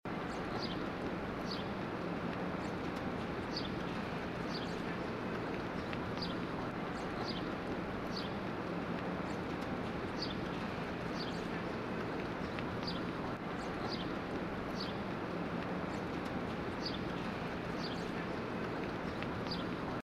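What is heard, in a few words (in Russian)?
городской парк с воробьем подальше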